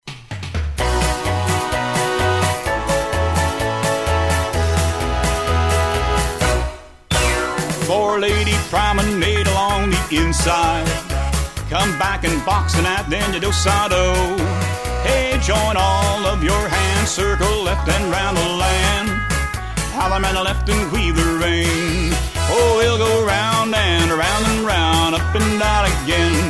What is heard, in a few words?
vocal